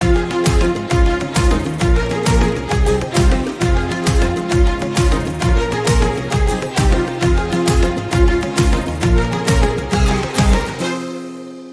Humming Flute BGM

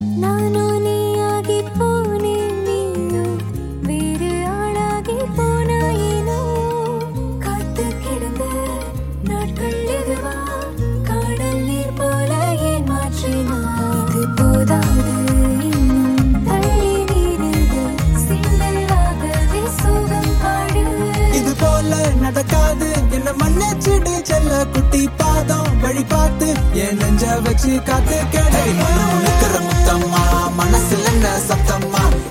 Female Ringtone

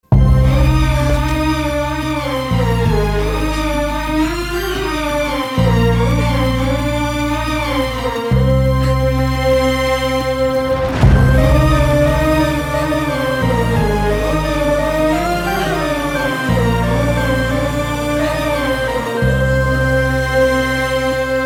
Love Sad Bgm; Ugram

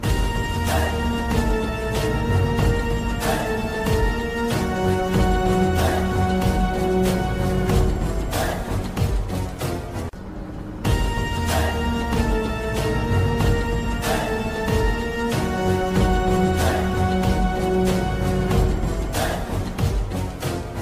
Interval BGM

